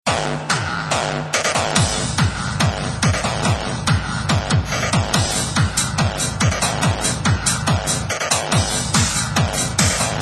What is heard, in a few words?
Techno-rave